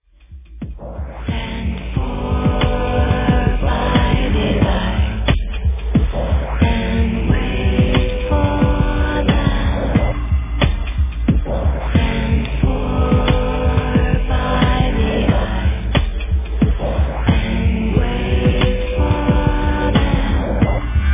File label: drone